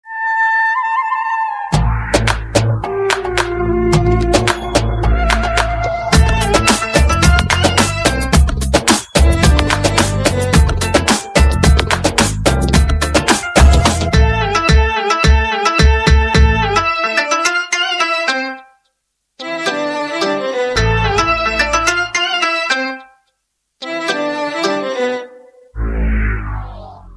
Arabic Dance Beats

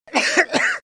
Man coughing

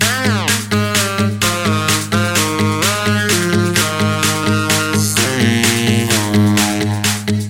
Text Alert

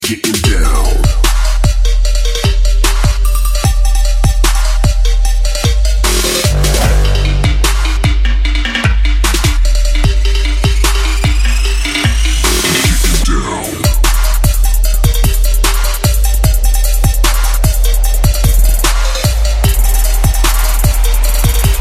Gettin Down TRAPBASS

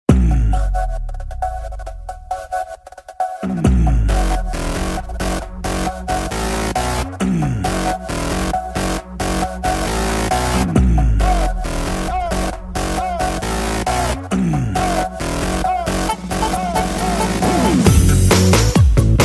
Hifi Music

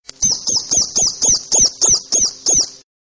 Falcon

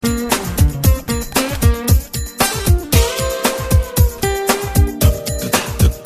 Guitar

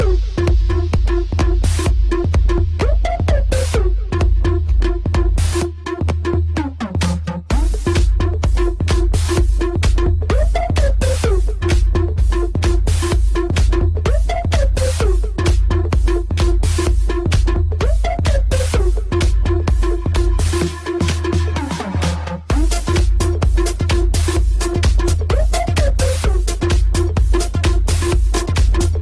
Dirty House

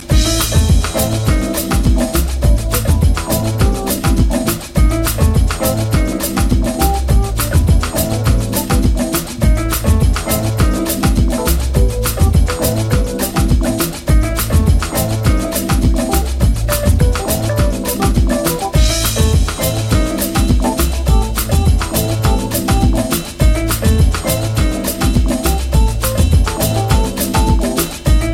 Chillout; Daiquiri

